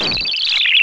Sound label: Freeze